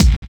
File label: kick, Drum and Bass